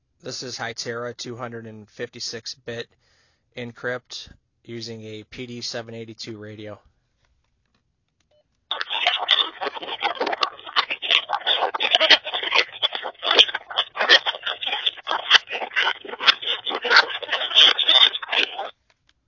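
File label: Hytera 256 encrypted; Hytera 256-Bit DMR Digital Encryption; As sampled from the PD782 handheld radio.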